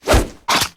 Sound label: pirate rap mic; rimes